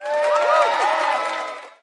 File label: throw flower